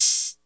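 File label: CR8K open hat; Roland CR-8000; drum machine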